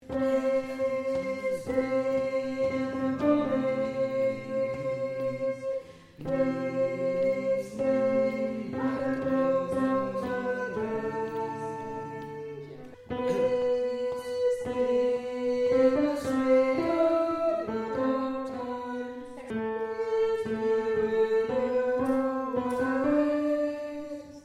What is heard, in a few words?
Tenor